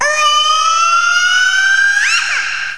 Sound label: End Sound; Monkey Shines 1.2.1